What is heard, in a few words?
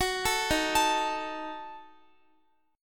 Eb Diminished, Guitar; Ebdim arpeggiated